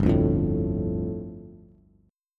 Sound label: G 7th Suspended 2nd G, Bass; G7sus2 strummed